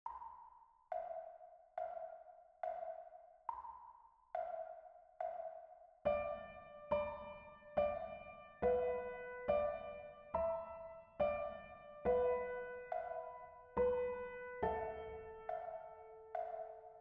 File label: Camptown Races melody; traditional songs; gitarre guitar